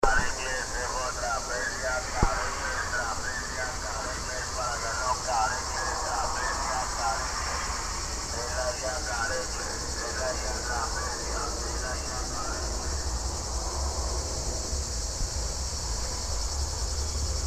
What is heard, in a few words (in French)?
grece koroni cafe grec